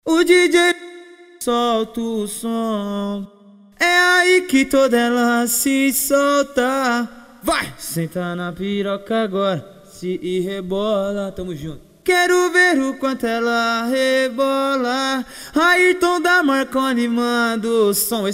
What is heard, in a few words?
Acapella